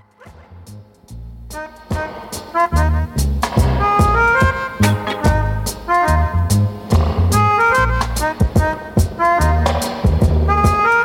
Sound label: Bass & Drums Version